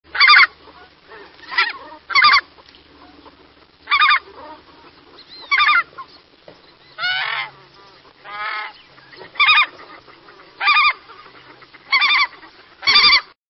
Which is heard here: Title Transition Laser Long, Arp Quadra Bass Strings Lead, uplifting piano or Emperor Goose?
Emperor Goose